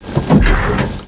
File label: Step